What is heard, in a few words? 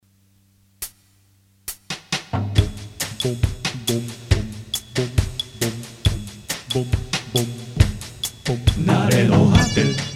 Jingle (Latin 2); FM NARENOHATE Jingle (Latin 2) -2024 Mix